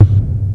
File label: Moog BD; Moog Modular55